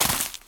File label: rocks land; Original TK Sounds